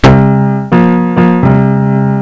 OMINOUS; Screen Saver Studio Deluxe 3.01

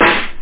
buff, drums snare; OctaMED SoundStudio V1

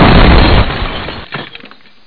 smash; Driver; CD Expert 39

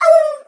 hero fail; Geschicklichkeit; AquaBubble2

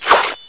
slash

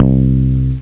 elec bass; Deutsche Edition 1; bavarian; instruments

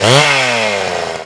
SAW REV, MEATCUTTER; Messiah; WEAPON

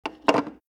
phone

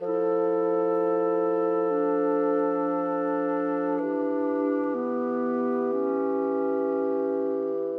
Cinematic Bassoon & Clarinet; Orchestral; Sonic Foundry

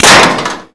weapon impact metal; Spearhead Demo; MacFormat 2003 June

